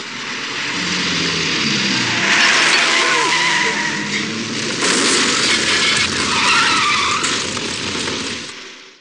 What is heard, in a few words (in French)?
Camion defonçant mur; Accidents voitrues